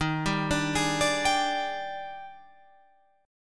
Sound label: Eb Major 11th, Guitar, Open F; EbM11; arpeggiated; JGuitar